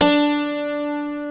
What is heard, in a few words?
santur; Timidity